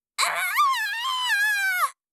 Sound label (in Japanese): ぎゃああぁぁぁっ！